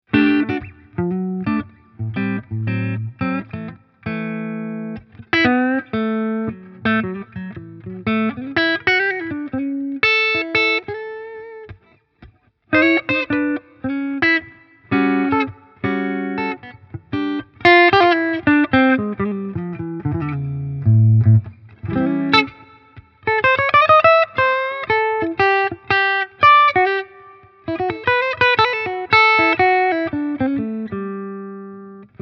Clean Hals erst HB dann SC

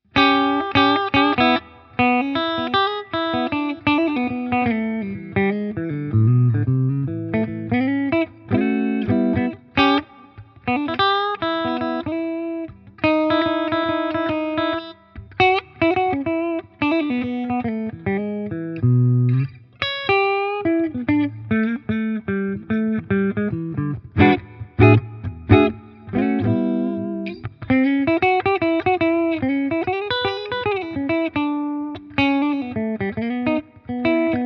Harley Benton SC Custom III beide Pickups clean

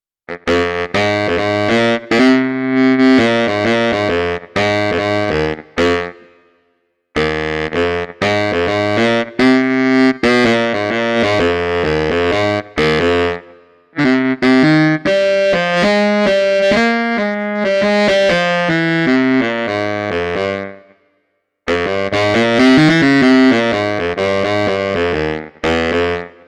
YDS-120 Baritonsax

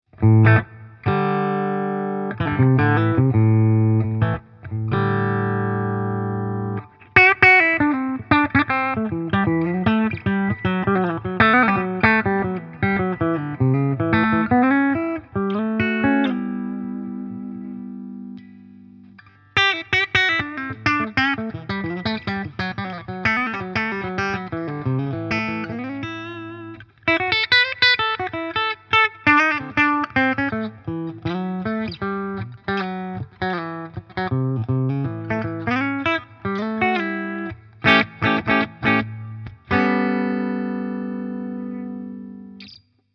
Harley Benton SC Custom III Steg Coil Split clean

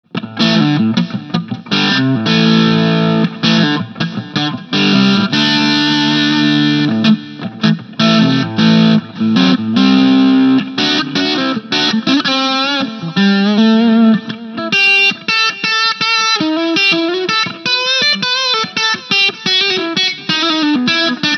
Mooer SD30i Mark V angezerrt Gain 20

